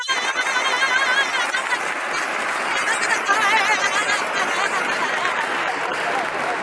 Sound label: Vocals Castanets Applause Rem